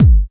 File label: acoustic bass drum sound g sharp key, Royality free bass drum sample tuned to the G# note